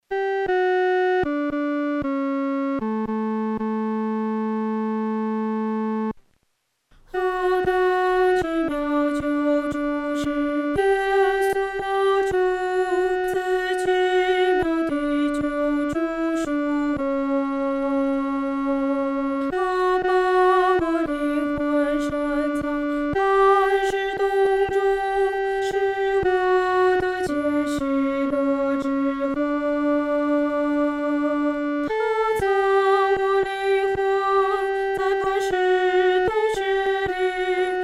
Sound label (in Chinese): 独唱（第二声）